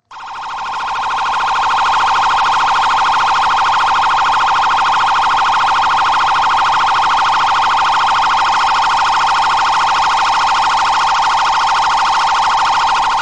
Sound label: Gojek Grab Nada Dering